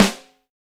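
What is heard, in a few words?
BRASS SNARE, HR-16 DRUMS; Drum Mashines; EdgeSounds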